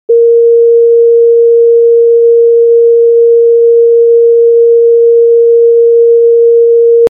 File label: a sharp 466